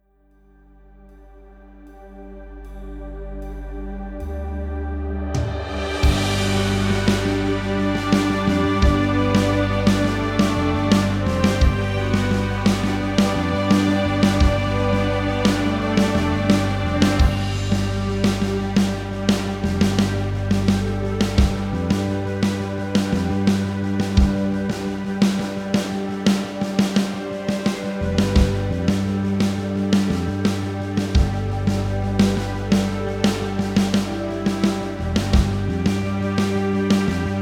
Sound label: rhythm